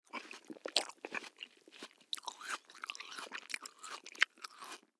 Human Bite Food; Bite Food Watermelon Juicy Wet Swallow Eat; Eating; Blastwave

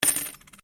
Coin Penny Multiple Drop Laminate; Common coins; Money; Objects

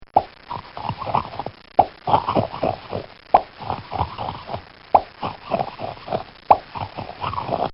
Cartoon Sound Effects Pop Crunch; Cartoon Sound Design; Bobby Cole

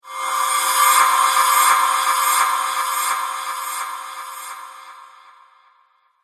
Reverse Cymbal Whoosh

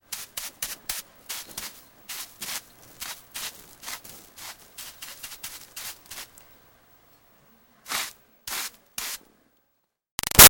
Foley Brush Dirt Archeaology; Archaeology; BigRoomSound

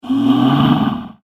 Minotaur In The Labyrinth, monster sound effect; fantasy sound effect; Monster Sound Effects

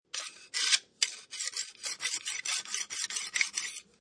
Knife Sharpener Finish Kitchen Knife Long; Scrapes (Sound Effects:Objects)

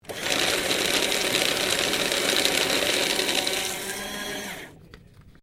SHREDDER PAPER OPERATING, common office equipment; Offices

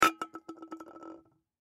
Can Set Down Wobble Aluminum Empty; Set Downs; Blastwave; Foley

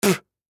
Human Spit; Spitting; Blastwave

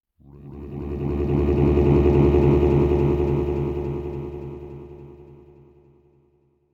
Probe Alien Mind Force; Alien Sound Effects; science fiction sound effect

